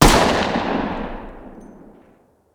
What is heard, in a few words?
fire 45 dist, uzi; arccw ud